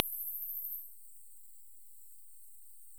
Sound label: Kerivoula papuensis